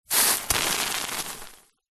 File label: horror acid burn; zapsplat